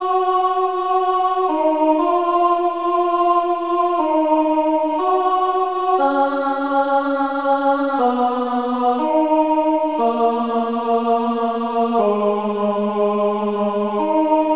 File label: church, chiptunes